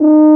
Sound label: F-TUBA ES1; Best Service; Alpine Volksmusik